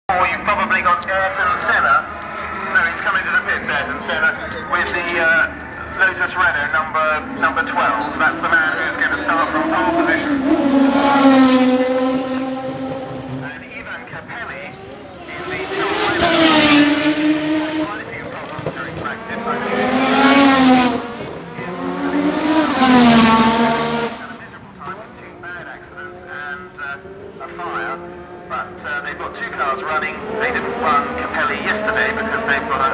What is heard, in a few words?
Adelaide F 1 Turbo Cars; Aust. AGP.; 1985